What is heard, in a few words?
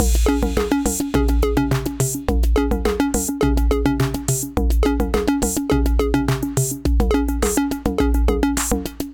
808 105 Beat, Drum Machines; Linegraf; Ableton